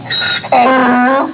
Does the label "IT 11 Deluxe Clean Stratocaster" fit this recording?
No